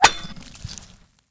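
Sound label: pickaxe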